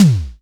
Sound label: Tom Fat Short, Tama TS-206